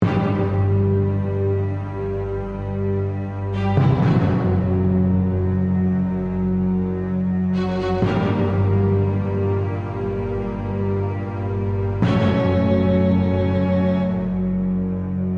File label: Orchestral Hit, Horror, Musical, Orchestral, Bed, Hits; Scary Soundscape Sound Effects; BlastWave